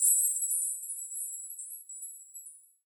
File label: bells, bowls; Best Service ProSamples vol.52 - World Instruments 2; AKAI